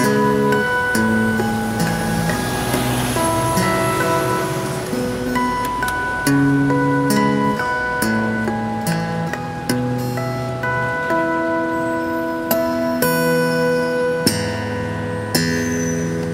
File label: Guitar Riff